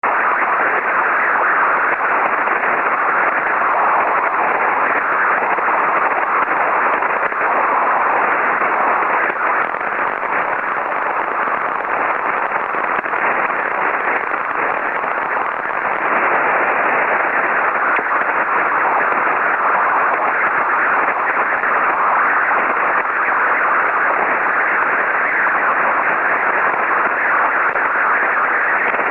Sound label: Static Heavy Radio, static